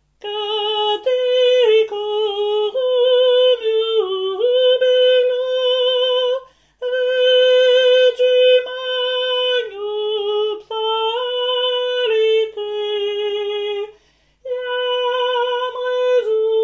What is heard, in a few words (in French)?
canticorum tenors